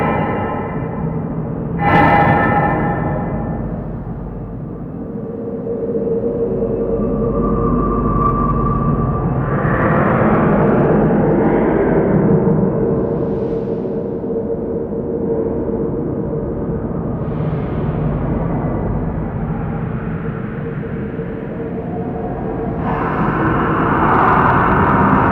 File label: Medium Bed Amb, Colony; Warframe; Ambience; Nokko Colony